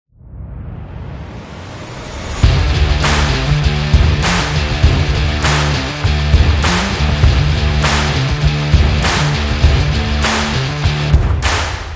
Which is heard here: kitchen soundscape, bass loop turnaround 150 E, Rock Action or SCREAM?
Rock Action